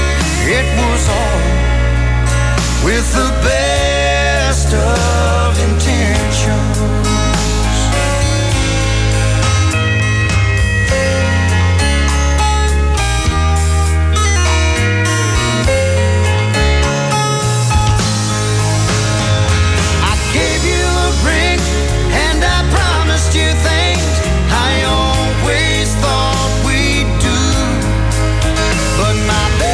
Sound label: country